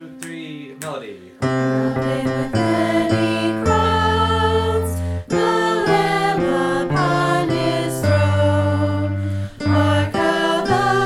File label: Crown Him BASS, choir